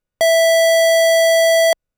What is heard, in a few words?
Sub E5; fleet keys Samples